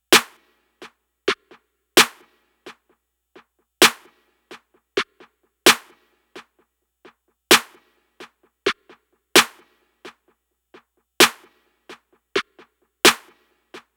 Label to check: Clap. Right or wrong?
Right